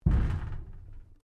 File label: Strike Metal Tank Exterior Rattle Soft